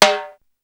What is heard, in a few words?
DANCE SET, DRUMS